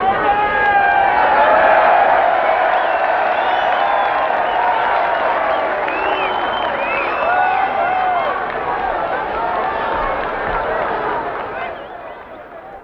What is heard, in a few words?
Baseball Home Run Cheer